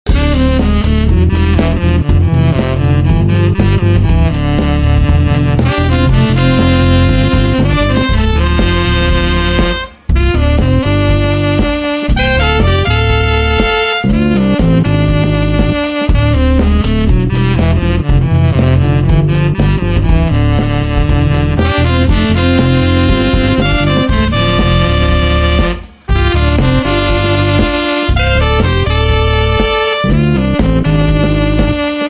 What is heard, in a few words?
Funk